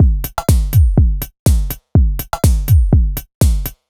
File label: Toydrums F# 123bpm, House Drumloops; Zero-G House Fabrik Drumloops